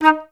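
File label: flutes staccato D#3; Best Service; Peter Siedlaczek's Advanced Orchestra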